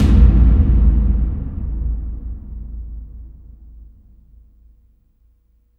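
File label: CAVERN TUB, AMBIENT DRUMS; Spectrasonic Distorted Reality 1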